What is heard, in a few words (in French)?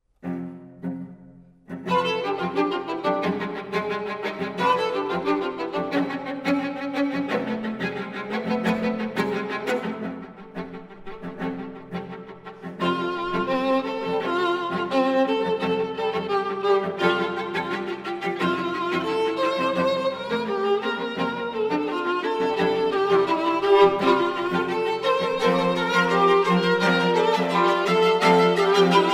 Quatuor a cordes; Choveaux